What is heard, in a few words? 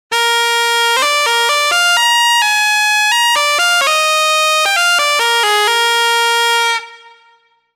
Chanter; TURBO VL